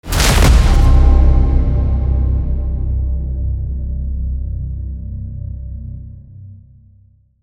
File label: braam